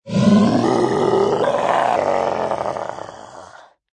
Creature Scream Pitches, Creature scream pitches. Monster creature or fantasy animal; long drawn-out growl going through various pitches; Monster Sound Effects